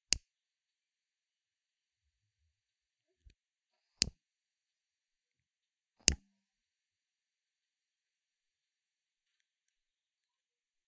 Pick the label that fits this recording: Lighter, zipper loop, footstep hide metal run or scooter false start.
Lighter